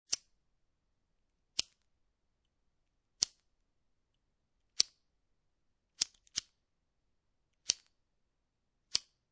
Lighter Flicks Many, Foley, Lighter, Flicks, Cigarette Lighter, Several Flicks; Bic